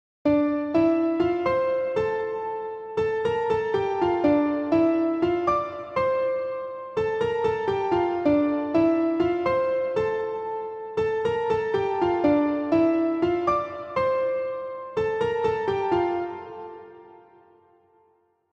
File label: BGM ringtone